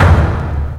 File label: hit, PERC; Techno Trance Essentials